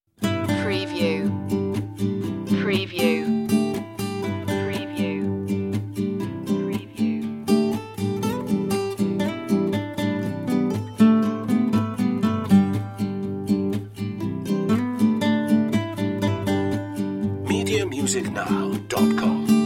guitar version

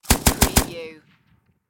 Machine Gun PKM

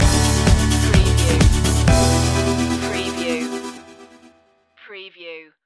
surf stinger

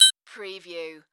Multimedia Beep

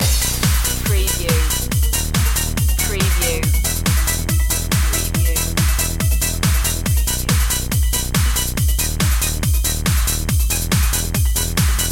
swirling beats loop

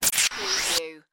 Scifi Production Element - Broken Sweep

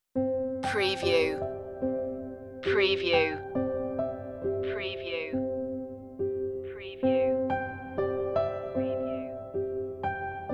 solo piano version

